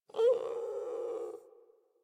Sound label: Puppy Idle Coo; Enemies; Vocals; Kubrow; Warframe